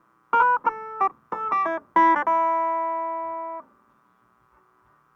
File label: clavinet, analog funk; Mixed Berry Jam; Sonic Foundry (Sony Creative Software) - What it Is! 70s Analog Funk